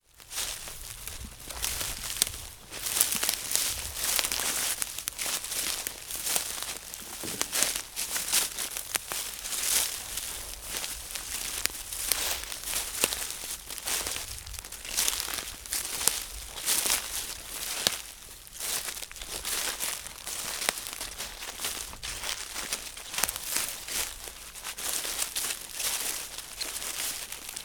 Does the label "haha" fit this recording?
No